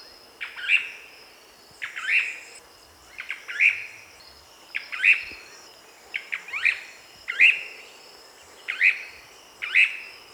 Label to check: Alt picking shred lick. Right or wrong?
Wrong